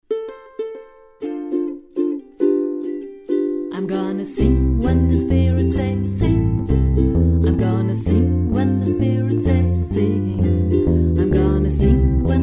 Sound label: I'm gonna sing mit u-bass; Ukulelen-Bass; Jemuku: Jedem Menschen eine Ukulele, Band 2; Spirituals